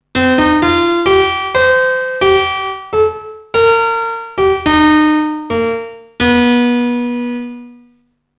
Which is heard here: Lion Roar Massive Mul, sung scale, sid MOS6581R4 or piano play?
piano play